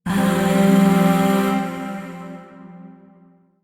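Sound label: Shawzin Pentatonic Minor Chord; Warframe; Zariman; Void Shawzin; Chords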